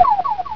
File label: disappr